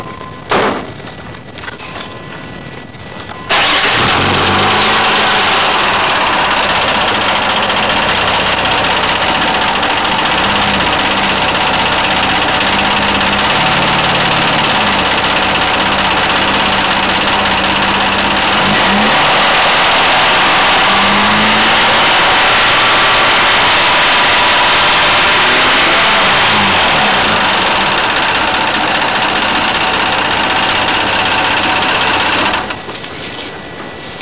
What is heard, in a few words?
engine